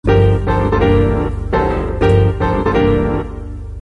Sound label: melody